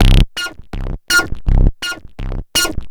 Bass, Futurist Drum & Bass

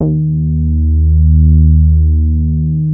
SB HORN; Wizoo - Powered Nord; STEREO LAYER

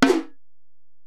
Ac Perc, Drum Machines Alesis DM-PRO

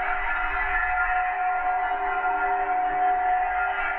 Tonal Re Draw, sparse soundscape

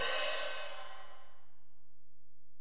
HiHat, Alesis DM-PRO

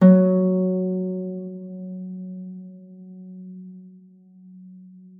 HARP FORTE F3; Xsample VOL-8

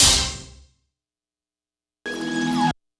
kit, Yamaha Motif-ES8

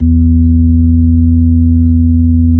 B-3 Club Xfade, Keyboards of The 60's and 70's

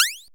PEWW; Ambient; Zero-G; Ambient 1

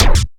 dry snares; Quasimidi Rave-o-lution 309

